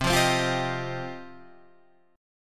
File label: F 7th Suspended 2nd, chord